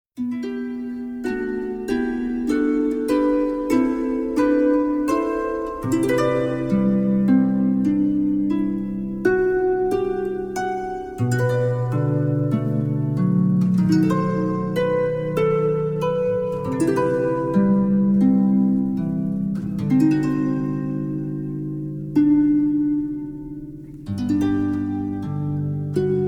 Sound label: harp